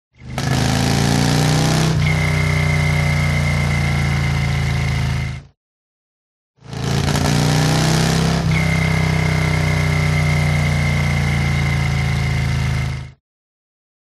TRANSPORTATION MOTORCYCLE Harley Accelerate Decelerate Whistle